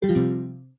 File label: Dialogue Close